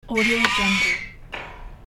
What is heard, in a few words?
alien spring space screech; hollywoodedge